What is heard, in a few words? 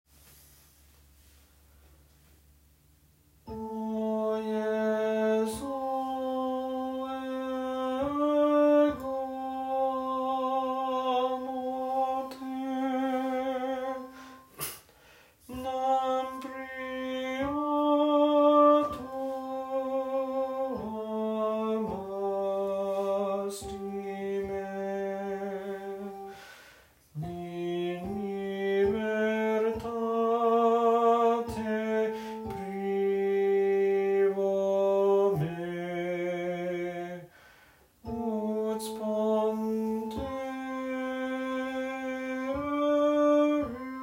tenor; o jesu